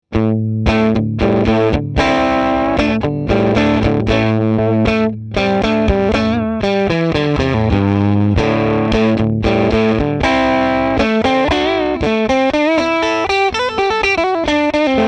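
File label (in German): E. Gitarre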